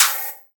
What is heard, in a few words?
Reverb Open Hat One Shot F Key, Royality free open hi hat sample tuned to the F note